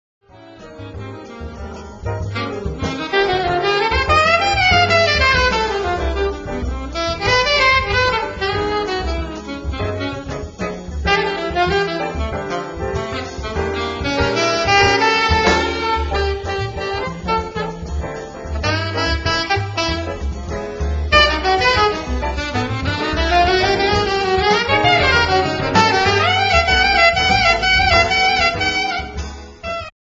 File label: Bossa